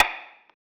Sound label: Percussion; Shockwave; Cymatics; House Starter Pack